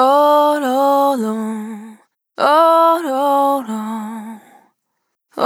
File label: Single Voice Melodic Loops